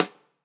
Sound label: Rim